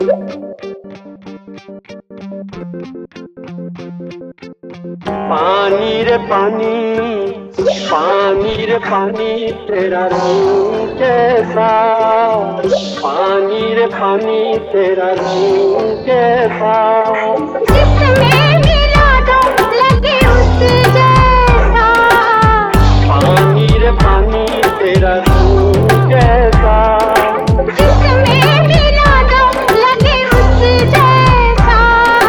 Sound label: indian trap